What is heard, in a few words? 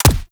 Weapon Punch Rifle; Warframe; Weapons; Rifles